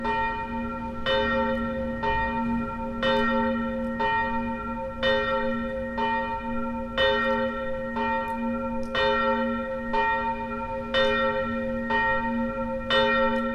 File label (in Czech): klaster zvon; broumov klaster; sv marketa